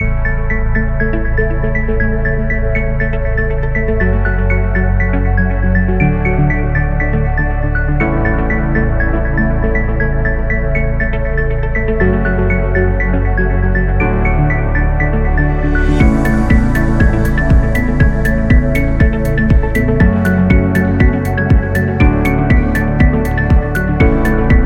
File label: Optimistic Chill Corporate Ambient